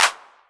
Clap, Novation Drumstation

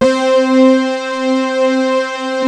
Moog synth; Rubber Chicken Software Sample Bank

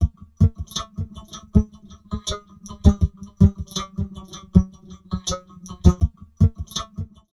VOCOD, Drum Loops Crazy Processed

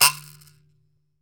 MBIRA D3, Kalimba; World O' Kalimba

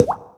Drip; Classic SFX; musicradar; cartoon caper

